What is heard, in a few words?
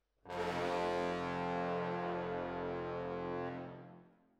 Horns Over